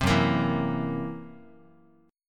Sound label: Gb 7th Flat 5th Gb down Guitar; 3,2,1,2,x,x; Listen to Gb7b5 strummed